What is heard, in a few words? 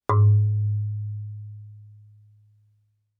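Marimba yarn fortissimo Ab4; MIS Pitches - 2014; Percussion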